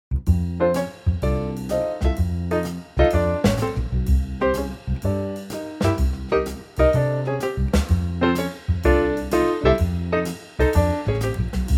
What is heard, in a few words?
F Trio, male; key - F - vocal range - Bb to D